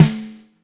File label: FAT SNR; Spectrasonics - Burning Grooves CD2